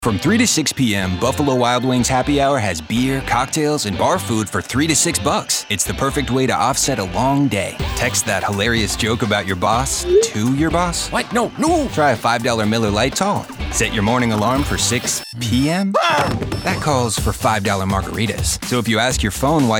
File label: Alarm Music